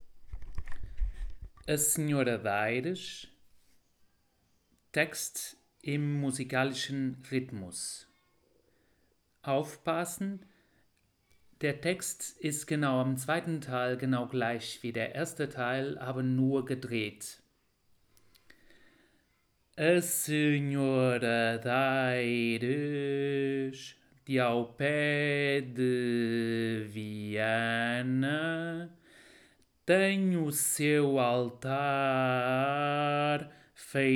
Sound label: A Senhora d´Aires Rhytmus